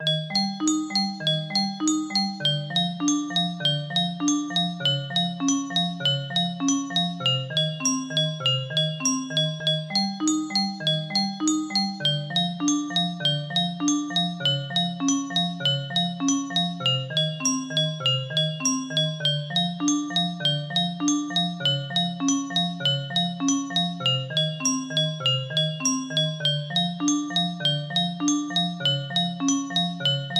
guitar stuff, music box

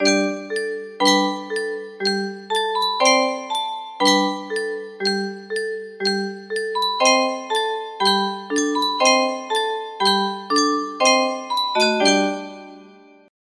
music box; stargate

